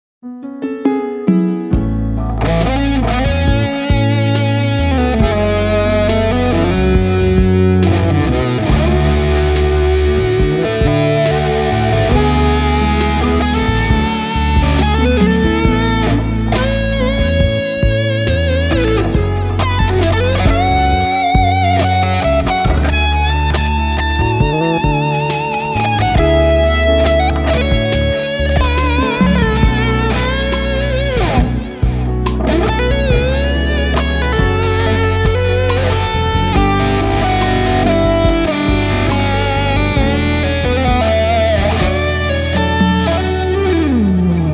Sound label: Balladesque Solo